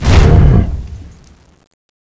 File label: cannon ready; fallentitan